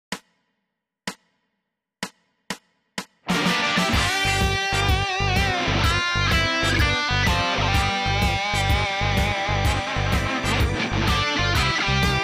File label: blues g